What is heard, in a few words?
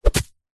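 spear sounds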